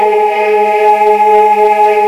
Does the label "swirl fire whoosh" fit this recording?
No